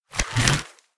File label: arrow load